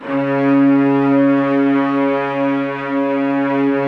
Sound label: LOW VLNS C#3; Q Up Arts - Denny Jaeger Master Violin Library